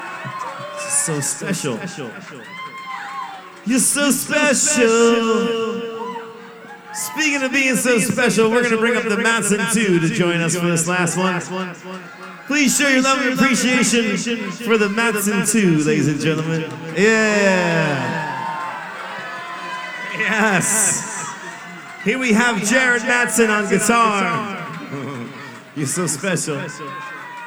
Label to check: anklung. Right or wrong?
Wrong